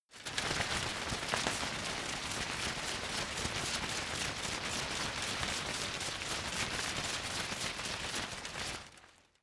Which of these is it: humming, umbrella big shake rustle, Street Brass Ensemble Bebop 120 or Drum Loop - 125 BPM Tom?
umbrella big shake rustle